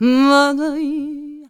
MEH1 VOX, Balkanish Traditions

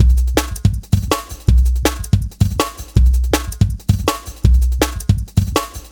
Copper 162, Jungle Straight Loops; Zero-G Jungle Warfare 1, 2 & 3

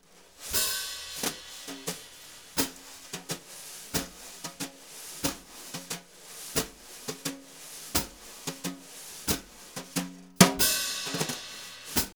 BRU, BRUSH; Univers Sons; Jazzistic

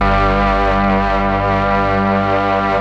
STR, STRING2+OBOE, M400; ILIO; Propeller Island Legendary

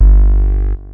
Bass One Shot (G), Synth Bass

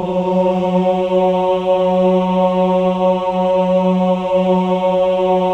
OH F#3, CHOIR OHS; Spectrasonics; Symphony of Voices

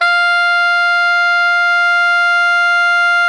SOP FF F 4, Saxophones; Greytsounds; Philip Wolfe David Crozier's Saxophones

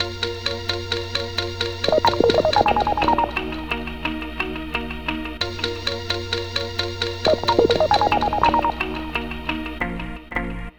Melody, Hip-Hop

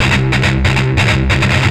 Riff, Industrial; Nasty

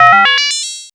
MOOG HAT; AMG - Vince Clarke Lucky Bastard

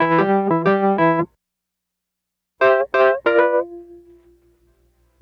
Call And Response Wurly A, 70s Analog Funk; Sonic Foundry (Sony Creative Software); What it Is!

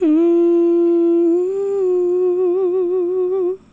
Adlib, Hip-Hop and RnB Vocals

